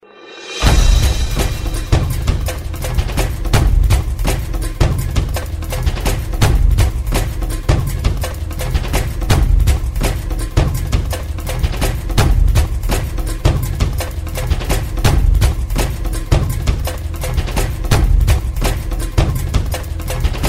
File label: Tribal Drums